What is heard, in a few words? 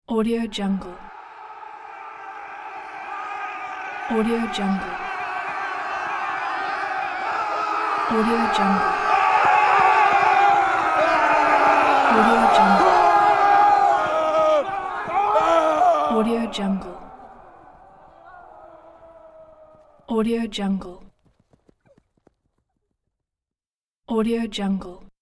battle cry running people for fighting